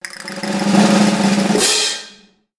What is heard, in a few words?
Cartoon Circus Jump Roll